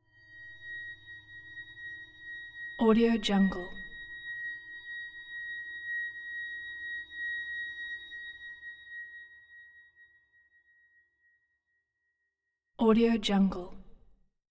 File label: tension waterphone sustained tone bowed approx pitch a6; soundmorph